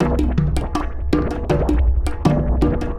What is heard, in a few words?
Klong Ya, Ethereal Textures Otherwordly Rhythms; Dancesummoor; Sonic Foundry (Sony Creative Software); Ma Ja Le